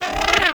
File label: Bird Three Chuff; Ambience; Warframe; Entrati